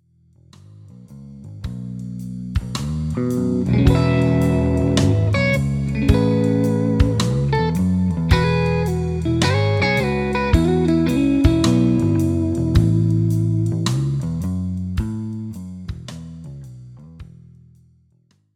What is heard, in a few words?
Chords For Melody, guitar; guitarist presents blues; The Turnaround